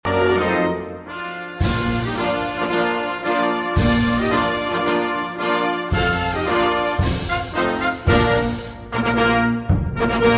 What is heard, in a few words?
Hymn Italy